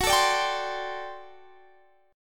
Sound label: G Major 7th Suspended 2nd Suspended 4th, Gb